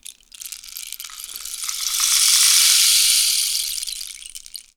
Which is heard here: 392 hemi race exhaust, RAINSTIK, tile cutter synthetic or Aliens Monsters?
RAINSTIK